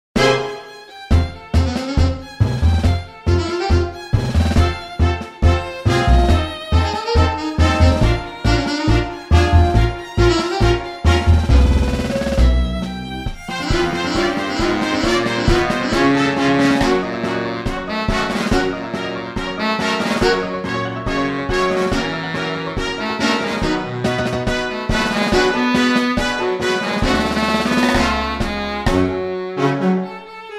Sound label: Tango; Chicago